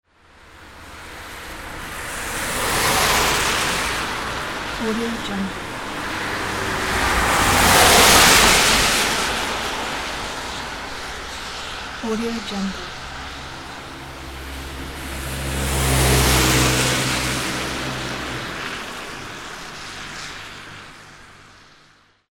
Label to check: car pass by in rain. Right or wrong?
Right